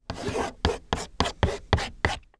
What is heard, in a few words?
chalk